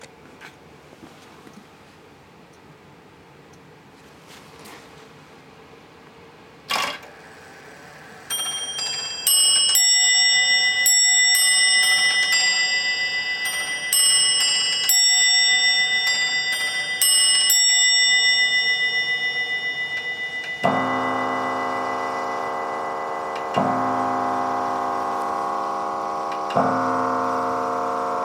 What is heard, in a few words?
english bracket chime